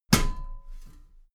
step metal ladder step top heavy

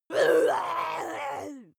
witch growl; kreaturen

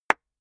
clapping very slowly

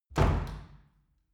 wood door old reverberant

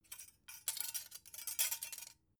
dough hook handle short

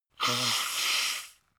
nose blow; soundpool

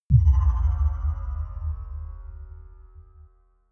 sci fi bell bass

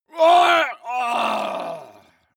male groan painful double

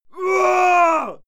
male fight moan heavy; soundpool

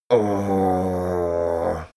male grumble annoyed; menschen; koerpergeraeusche; soundpool

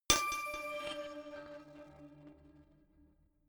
notification glockenspiel crazy single low; instrumente

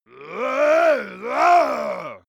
monster male growl aggressive; kreaturen

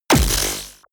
sci-fi shock blast

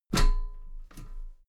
step metal ladder step top regular

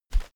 whoosh shredded paper soft deep; swooshes-swishes

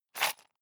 sundries shake hard; berufe; handwerker; soundpool

